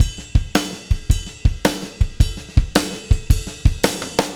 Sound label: RIDE, ROCK